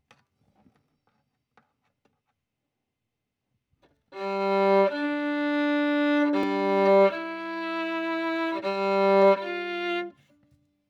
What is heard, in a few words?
Violin